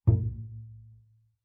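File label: Bass pizzicato fortissimo sul E Bb1, Double Bass; MIS Pitches - 2014; Strings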